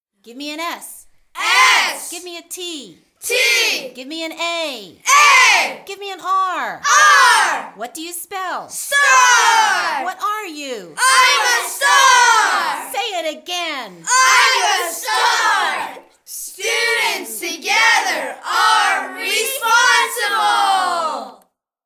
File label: STAR Cheer